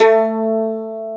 Mandolin A2; Planet Earth; Digital Sound Factory Planet Earth

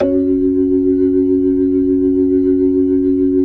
B-3, Jazz

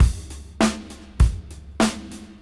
Old School 101 bpm; AMG - Keith LeBlanc Phat Butt Jams

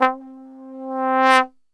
C, Brass; Best Service; Brass Super Section

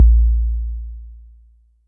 Sub, Electric Kick, Yamaha DTXpress

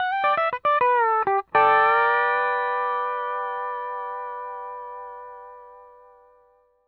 L, Whole Lotta Country, ENDING105

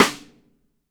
METAL, RIM SHOT

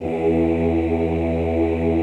OOH E1, Classical Choir; Best Service; Extended Classical Choir; OOH MIX

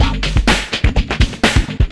housefunk; Sonic Foundry (Sony Creative Software); Ugly Remnants VOL-2

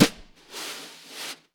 Vintage Snare Drum; Q Up Arts; Johnny.C's Vintage Snare Drum; 5.5X14 SLR

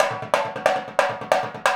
Indian Drum

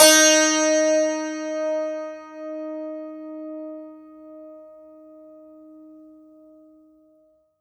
STR, SANTOOR

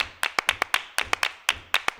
120 CLAPS

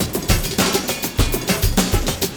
LOOP, Drum & Bass, 100 BPM; Ueberschall; Drum & Bass Resonance

